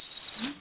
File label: rocket fuse